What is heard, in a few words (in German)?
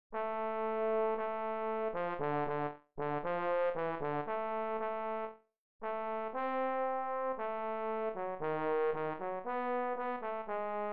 bass rezitativ und chor